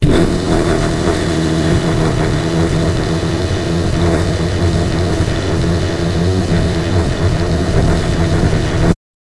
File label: jingle